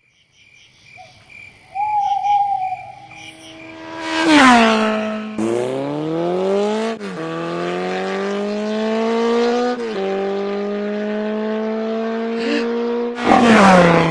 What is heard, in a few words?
opening score; escape from frankensteins tower